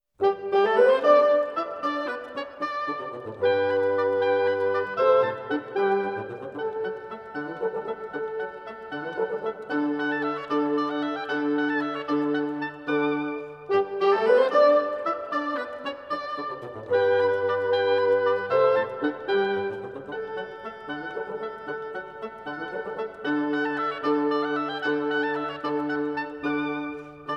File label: Divertimento No. 1 for Harpsichord and Wind Instruments; in E-Flat Major